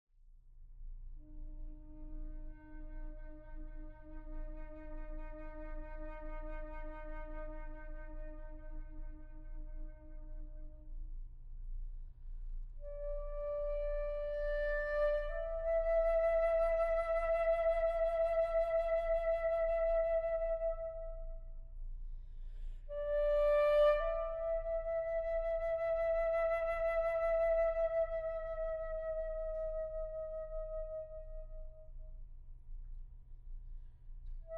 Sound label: Solo Flute